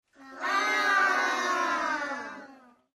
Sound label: disappointed little children shout ahh; sound-ideas